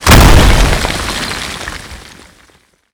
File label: Projectile Sounds; Red Orchestra 41-45